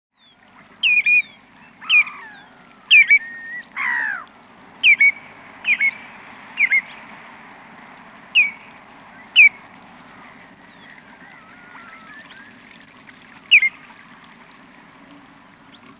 Greenshank

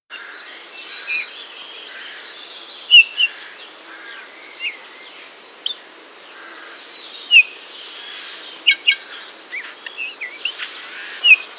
Bullfinch, birdsong

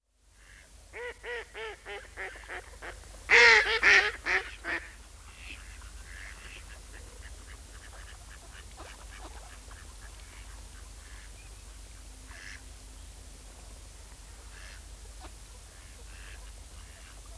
Mallard, birdsong

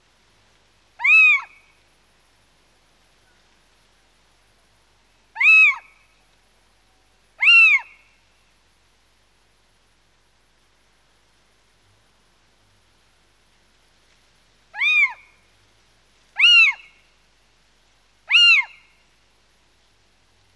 Little Owl

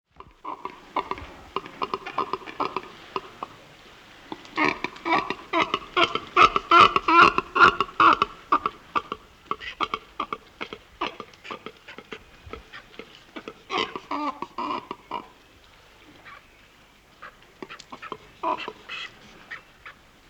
Shag

